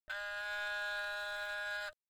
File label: haegeum; gugak